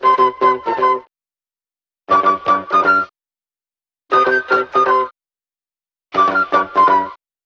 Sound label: flute, Loops, Phonky